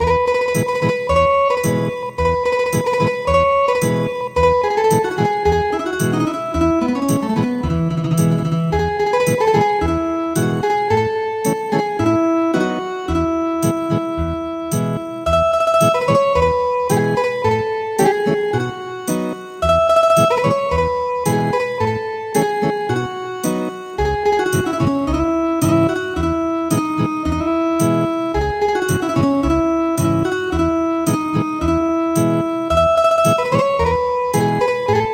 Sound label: Ethnic Guitar; He is born